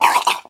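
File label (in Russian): unclear sound of strong nausea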